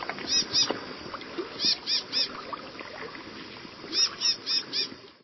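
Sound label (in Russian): вода озеро животные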